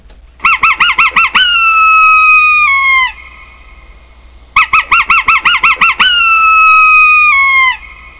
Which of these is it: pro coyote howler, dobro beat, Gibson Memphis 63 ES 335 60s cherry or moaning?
pro coyote howler